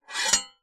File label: sword slow scrape on metal; zapsplat